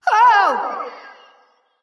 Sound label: fear light; smite; voicepack; hel